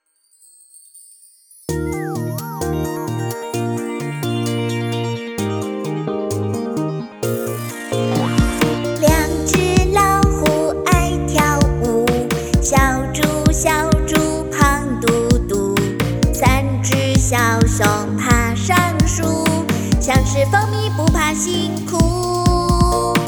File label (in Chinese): 儿歌女童